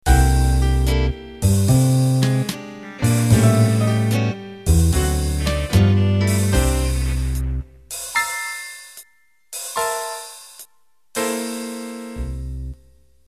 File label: Big Band Ballad End